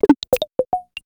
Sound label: lame button